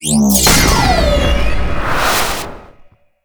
Ghost Swords; MagicItem GhostSwords SFX CutScene 01

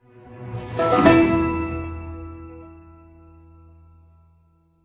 stinger Chest Opened

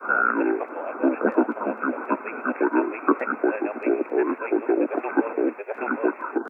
Lrad Brainwashing Signal; lethal company

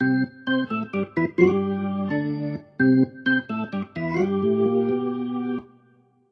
Organ; Fourth of July 2019

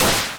Boomerang Hit; gamerip

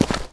Step; Bath; Neighbours From Hell Console Edition; GC, Xbox

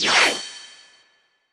Side Window Scroll In

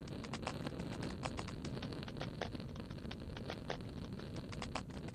Fire Kindle

